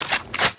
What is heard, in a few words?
scar reload, guns